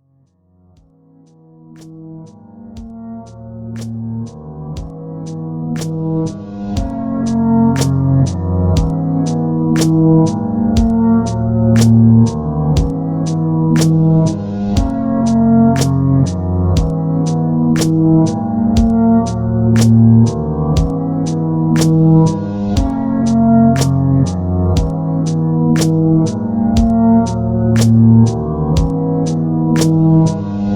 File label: beat